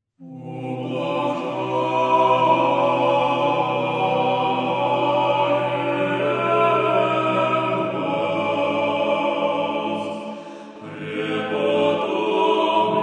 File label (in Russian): Величание Клиросный хор; прп. Сергию и Герману Валаамским; Клиросный хор Валаамского подворья в С-Петербурге; pesnopeniya bratii valaamskogo monastyrya